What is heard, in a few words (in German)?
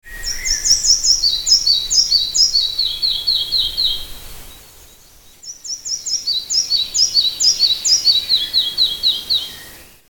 zwergschnaepper